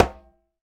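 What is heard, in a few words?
Conga Lo Mute; Roland BK-5; Percussion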